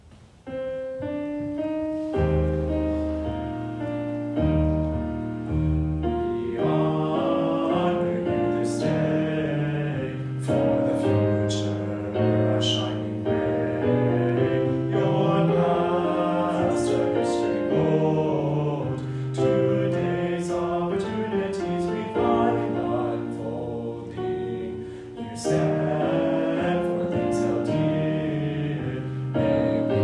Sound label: Loyalty Song bass; TBS